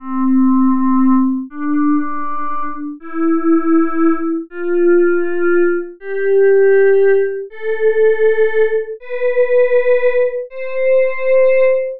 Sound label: just vs pythag major sc